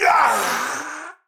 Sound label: Death, Battle; Warframe; Tau; Dax; Knight